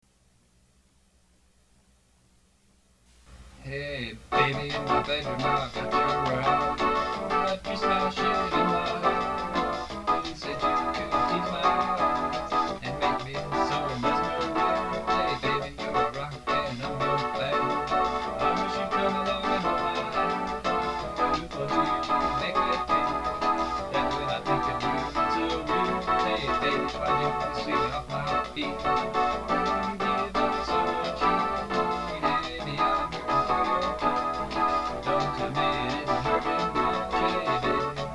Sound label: chorus